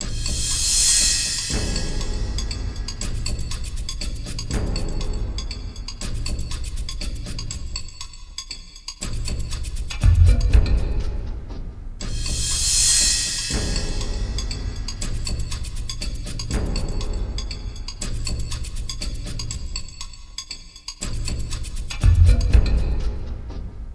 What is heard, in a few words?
Boss combat; Bosscombat Ch02a 02